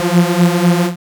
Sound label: BLAST F2; dubstep; Jack Sparrow; Deep Dubstep Progressions